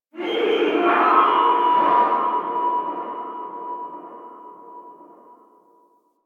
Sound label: Stadium Infested Far; Warframe; Ambience; Nineteen Ninety Nine